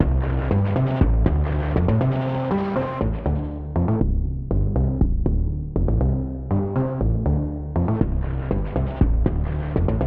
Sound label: Augmented Piano Bass